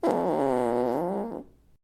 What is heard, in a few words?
common fart sound effect funny sound effects